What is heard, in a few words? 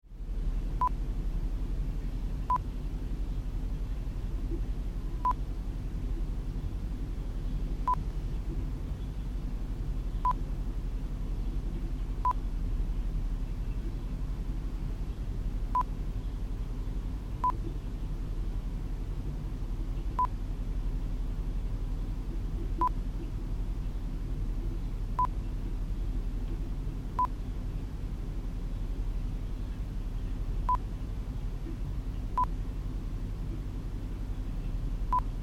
Hotel Room Ambience Daytime